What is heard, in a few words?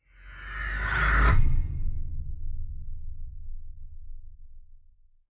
shock reverse; Joe's Diner